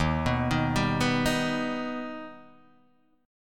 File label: Eb Augmented Eb down Guitar Half Step Down